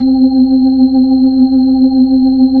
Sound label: B-3 Chorus